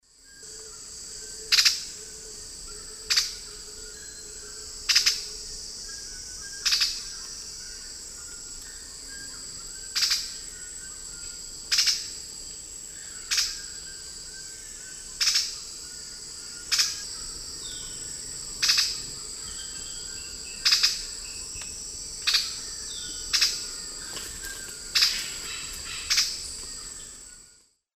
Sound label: Philydor pyrrhodes, call; S. America; Eastern Lowlands